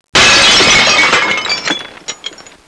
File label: glass break